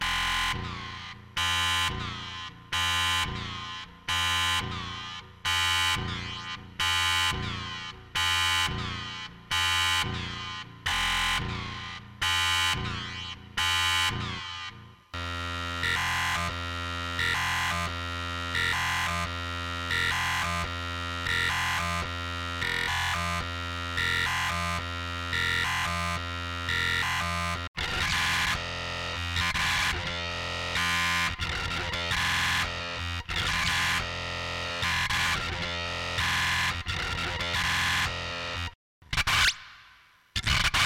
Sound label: BENDING 60 to 67; TI2 SYNTHESIZER